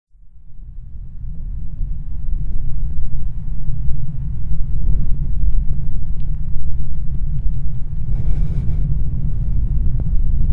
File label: wind